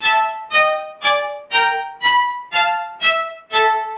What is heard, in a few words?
four descending plucked string notes